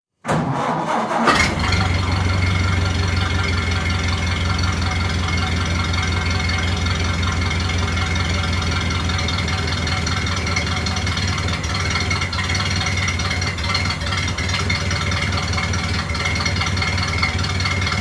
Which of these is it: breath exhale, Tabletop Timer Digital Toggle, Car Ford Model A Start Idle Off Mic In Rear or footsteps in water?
Car Ford Model A Start Idle Off Mic In Rear